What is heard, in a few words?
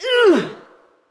Attack Heavy, Creature; Eydis